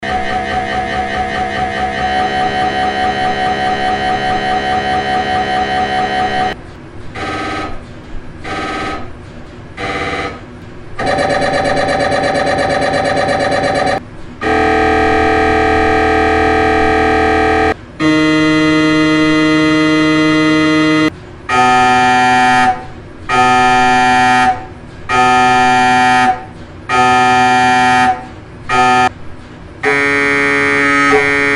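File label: MRI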